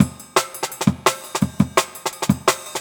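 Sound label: Jungle Breaks, 170 bpm; ShallowEnd; Original Jungle Breaks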